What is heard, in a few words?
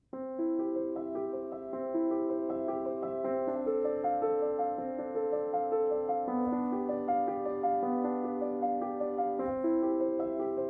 Prelude in C Major; The Well-Tempered Clavier Book 1; BWV 846